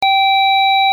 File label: Beep; Beep 0 - Lowest; Museum Alarm Options